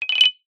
cartoon fast shake marimba

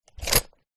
sport buody builder belt buckle undo

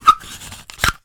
dehumidifying egg remove from holder

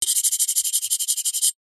foley metal pole scratch sharp object

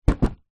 leisure game box down on carpet card; zapsplat

